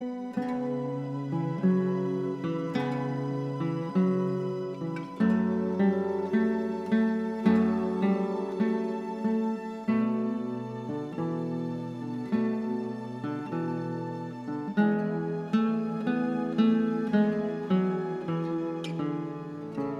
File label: Largo (D Major for guitar); Vivaldi